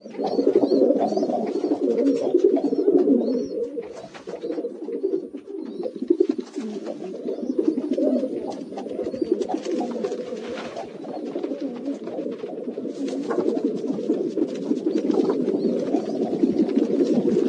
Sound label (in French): pigeon, oiseaux